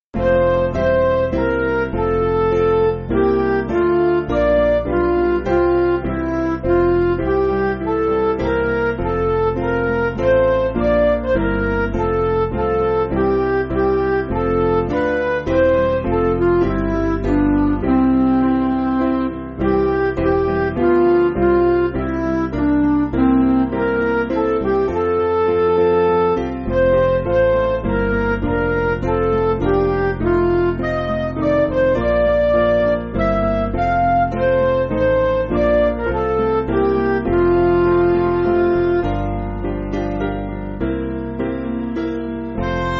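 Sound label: Music Backing Files for Churches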